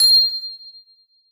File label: glockenspiel c6; Percussion; Sonatina Symphonic Orchestra